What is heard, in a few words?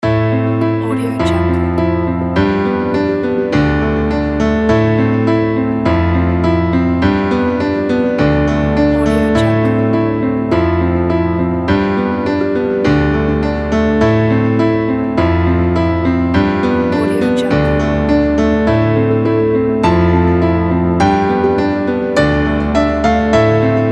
inspiring piano